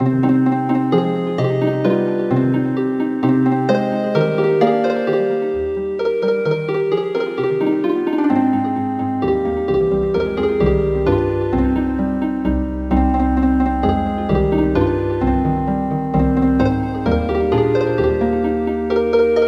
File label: Healin Harp